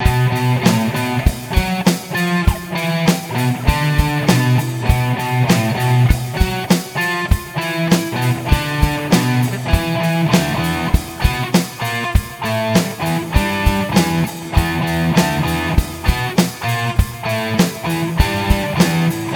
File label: Jazz Guitar Riffs Electric Guitar Lick